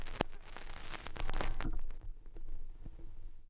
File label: Record Noise, Vinyl Record